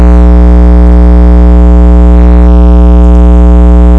scream

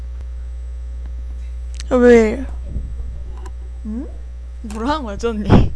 wheel

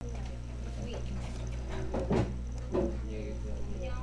kick

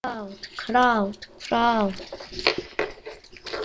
crowd

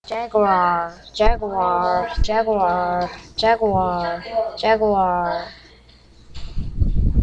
jaguar

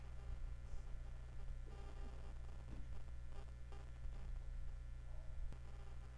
rinse

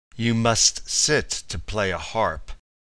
harp

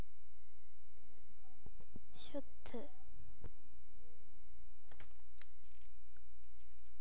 shoot

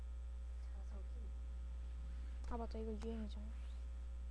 splash

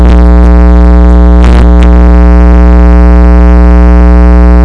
hit

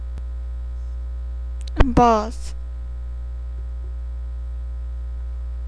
buzz

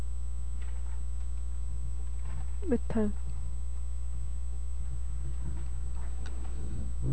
metal

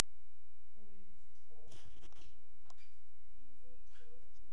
shoot

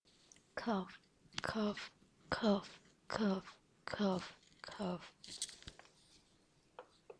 cough

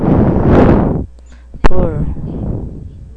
boar

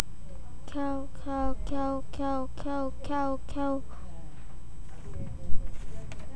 cow

